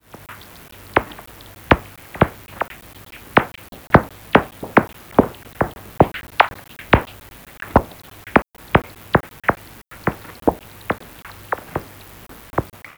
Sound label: N noctula; Hte-Vienne; M-Barataud